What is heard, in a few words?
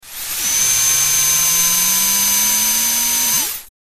Helium Tank Release, gas